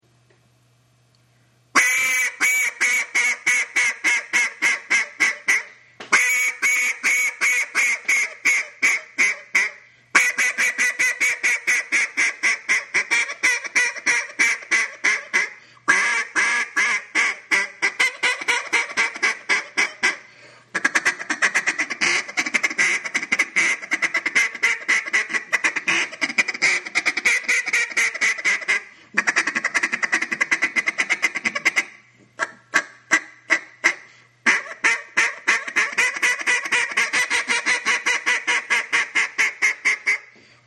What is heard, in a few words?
Duck Call; RNTDCDiablo